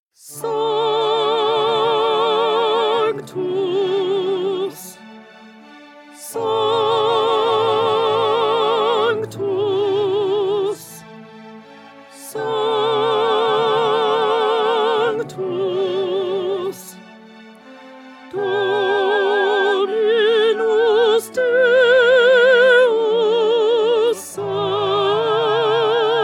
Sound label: Alto Sanctus; 2020 Mozart Requiem